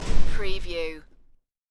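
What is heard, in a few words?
Garage Door Slam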